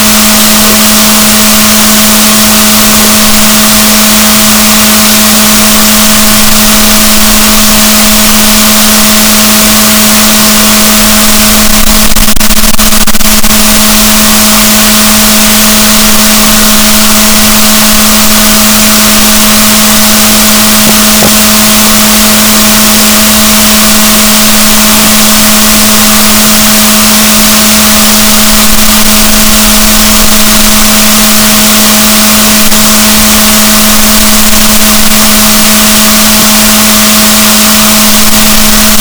rorquals negatif, gaussian noise; Balaenoptera Physalus; SNR 6dB

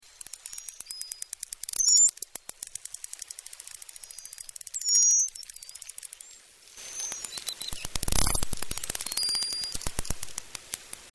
pseudorca crassidens; MALDIVES; DOSITS

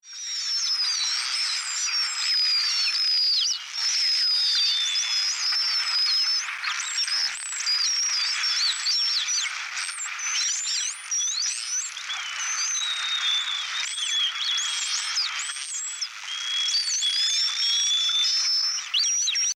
North Bottlenose Whale, Voices in the sea

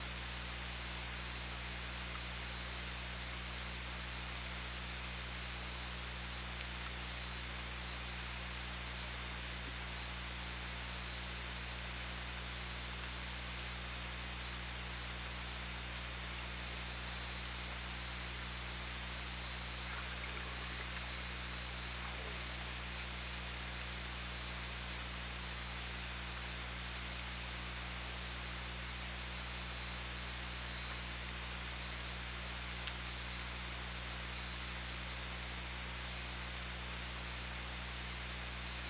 OrcaLab

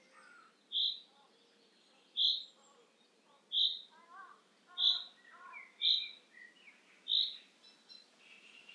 Fringilla coelebs; SETE

